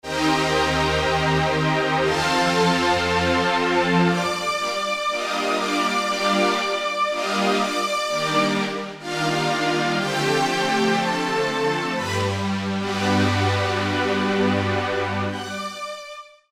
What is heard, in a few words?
WARM STRINGS, Roland SC-880; Factory Patches